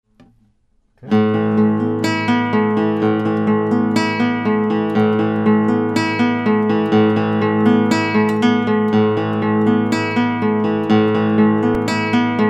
RME PreAmp

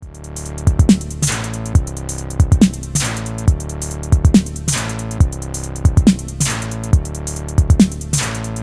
Roland SBX 1 Shuffle 50

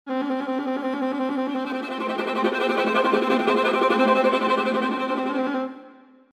Emotional Viola Major Trill To Sulponticello Trem

